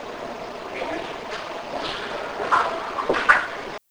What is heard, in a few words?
Door Creeking